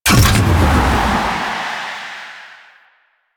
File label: Void Dragon Jump Off; Enemies; Warframe; Duviri